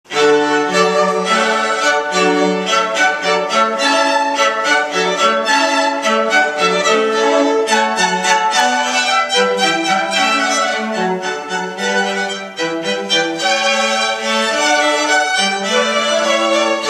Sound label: Haendel Hornpipe